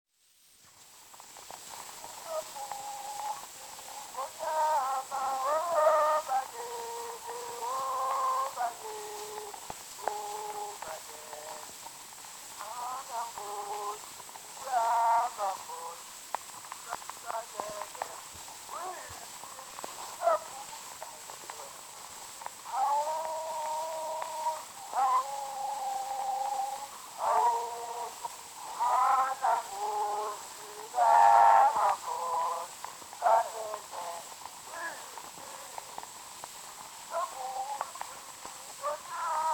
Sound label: Zulu Recordings; Father Franz Mayr; Ihubo lomkosi